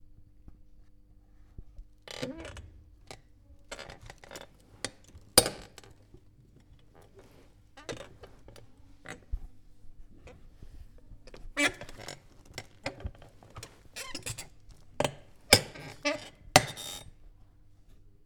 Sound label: Chair